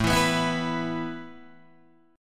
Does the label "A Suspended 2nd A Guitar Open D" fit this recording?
Yes